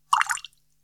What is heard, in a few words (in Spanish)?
Agua